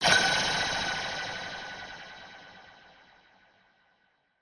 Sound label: Anime LFO Tail